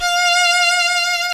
string; Zoom - Release 2 (1996); Active Software; strings

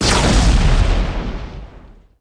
blue railgun

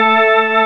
rockorg; cubic

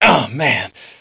pain, player; SIN; blade

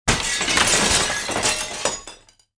Glass; crime

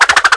spin; lomax

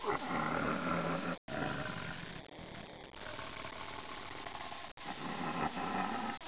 TIGER; 10,000 Graphics Pack Volume 1; Media Graphics International

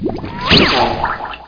boat

